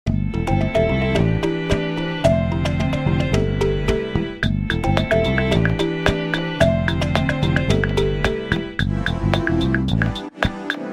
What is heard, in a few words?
Jungle Vibz